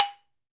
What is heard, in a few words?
MARIMBA G 2; AKAI S-Series CD-ROM Sound Library VOL-7; MINI MARIMBA